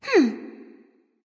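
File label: thinking, nonverbal; smite; voicepack; khepri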